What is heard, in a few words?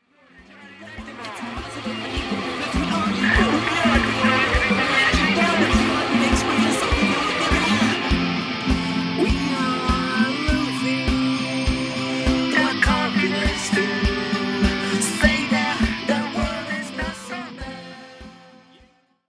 shout